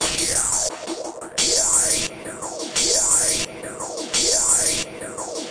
superphase hat things, drum loops and breaks, 174 bpm; 161 to 180 bpm